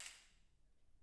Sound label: hall impulse; 講堂2; プロジェクトA